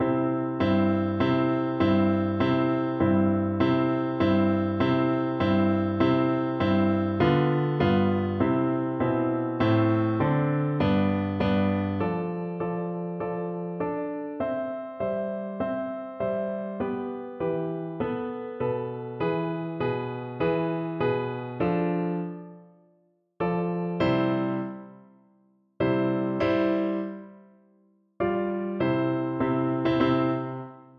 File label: Oboe; Bizet, Georges - Toreador Song from Carmen